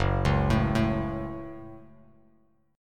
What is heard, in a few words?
F sharp Minor F sharp, Bass Standard; arpeggiated; JGuitar